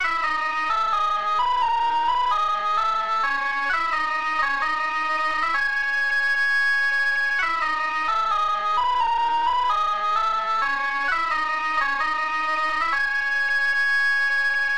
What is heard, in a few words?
Synth